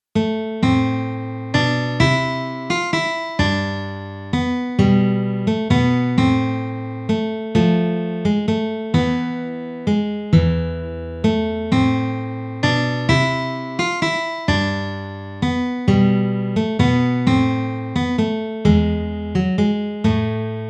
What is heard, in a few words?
Guitar; Greensleeves